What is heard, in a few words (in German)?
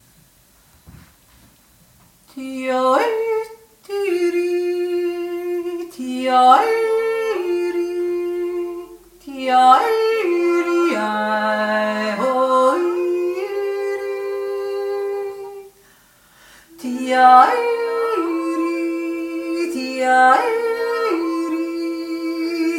verkehrte erste stimme